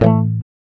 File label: GUI A, ACOUSTIC